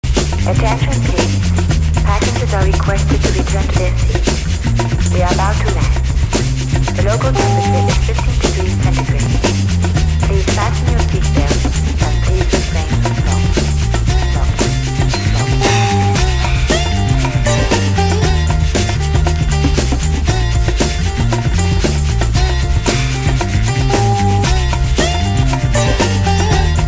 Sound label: Indian Sitar & World Jazz